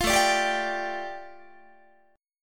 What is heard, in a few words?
A 7th Suspended 4th Sharp 5th A, Guitar; A7sus4#5 strummed; 20,17,17,17,18,18; 4A,4E,5A,5D,5G#,6C#